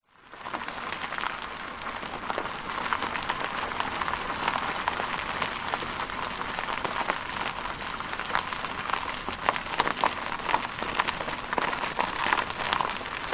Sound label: vehicles car wheels roll engine off dirt track grit short stop